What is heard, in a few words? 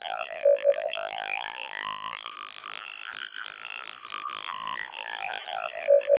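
siren, CODEC2 vocoder; 700 bps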